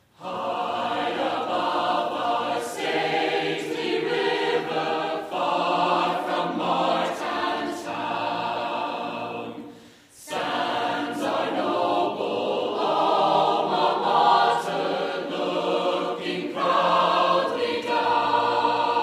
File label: Alma Mater Vocal